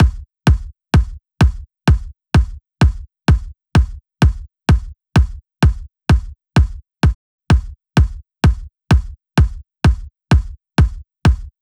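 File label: Drum Loop 128 BPM bass drum; VEC5 Multi Drum Loop 50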